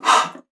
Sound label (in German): Luft; Xsample VOL-3; STACC+FX